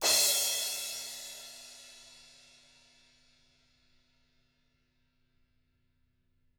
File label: TAMA, Drums